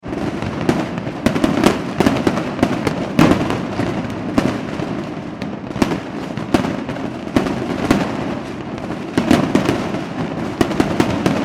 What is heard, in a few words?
fireworks display intense; Intense Explosions At Large Commercial Fireworks Display; McKinneySound